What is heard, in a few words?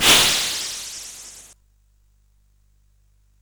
SFX; Narco Police